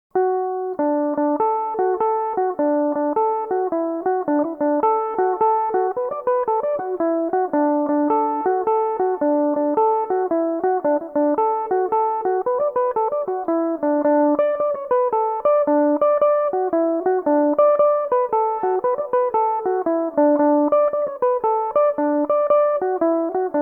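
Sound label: D major, mandolin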